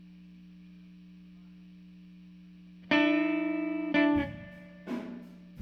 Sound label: GTR